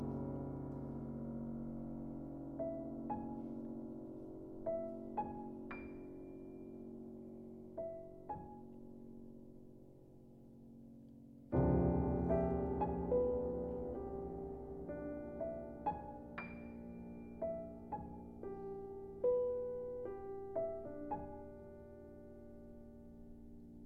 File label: Ballad